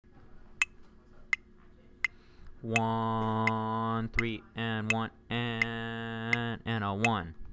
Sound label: Rhythm, clarinet